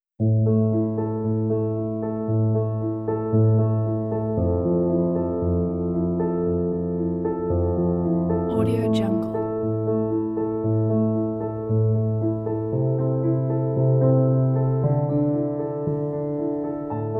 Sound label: suspended emotional background